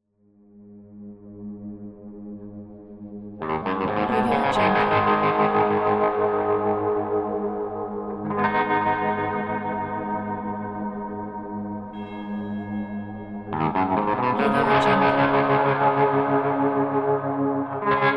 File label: western guitar